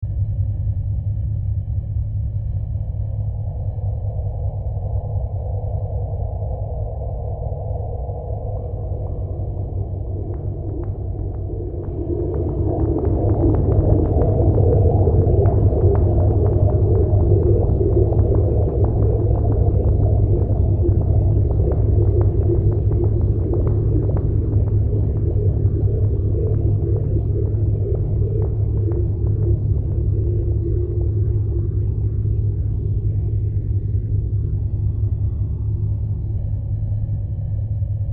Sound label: Scary Atmosphere With Suspense 100bpm; Scary Soundscapes; Horror; Crafted Signals